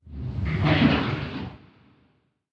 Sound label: water lap; nuke